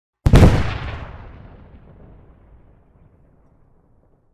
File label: Explode